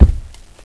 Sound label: player step